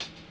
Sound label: RAGGA; Spectrasonics; Metamorphosis; RAGGAVYNL